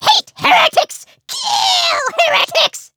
grunt; alphamoon

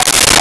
Bongo; Nintendo; July 2020 Gigaleak

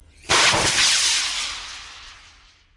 rocket, fire

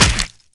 bone crunch impact, melee hit

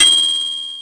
ching; Nintendo; July 2020 Gigaleak

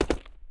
jump, footsteps hard surface

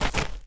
dirt land, footsteps; chief

